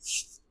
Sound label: rub; shweets sim